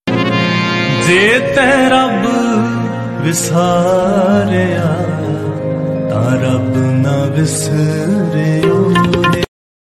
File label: punjabi shabad gurbani kirtan; waheguru ji